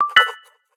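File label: Pen detach alert; Samsung; Galaxy S25 Ultra; ui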